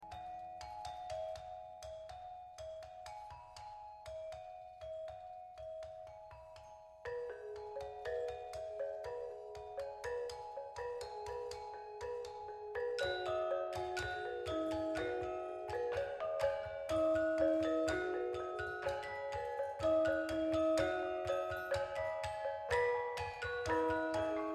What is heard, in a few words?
carillon